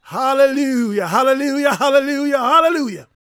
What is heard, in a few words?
gospel male, vocal; ILIO; Vocal Planet